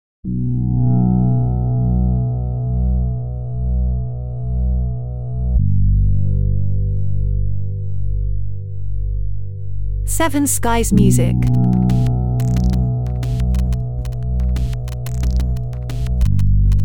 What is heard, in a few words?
electro orchestral suspense